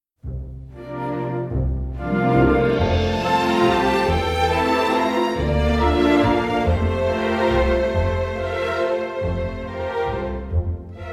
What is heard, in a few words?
Winter's Waltz